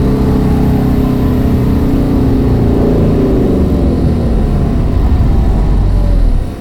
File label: first shift accel down, car; vehicles; garrysmod; sincar